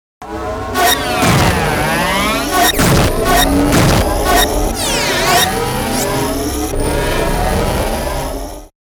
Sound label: Fuzz Glitch Hit Wash Warp; Production Element Imaging Element Accent Transition; 5.1 STEREO MIX; Blastwave